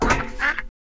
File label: duck